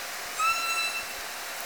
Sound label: call, Orca; SRKW; Alwin